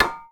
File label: impact metal; Epic Toon FX